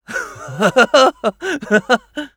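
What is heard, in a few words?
laugh evil, French; [Rire Machiavélique]; Scout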